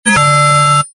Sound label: beep doodle ooh electronic